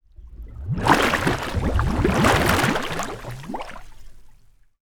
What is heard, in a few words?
Water; TOOLKIT vol.2